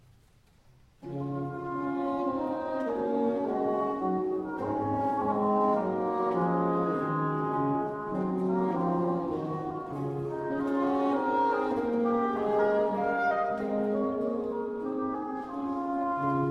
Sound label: Oboe d'amore